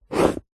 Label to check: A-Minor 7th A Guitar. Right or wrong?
Wrong